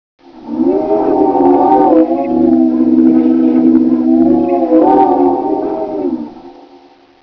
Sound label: tube mourn